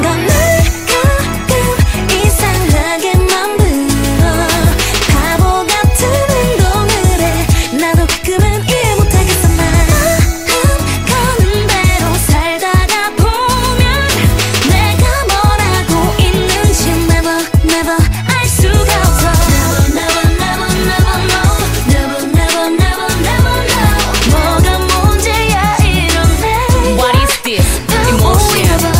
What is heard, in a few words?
Emotion chorus